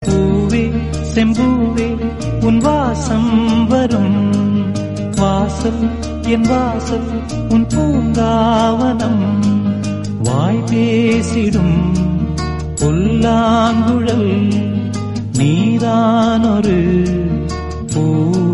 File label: tamil